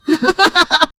human

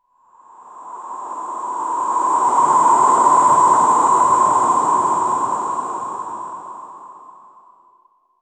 swoosh with a wind

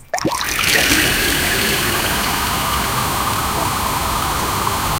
a powerfull strong flow, river

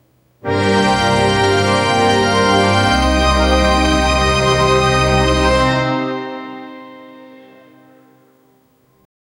tutti of C key, orchestra

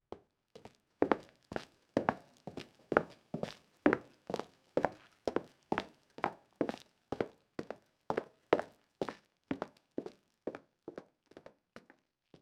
sounds of footsteps on th

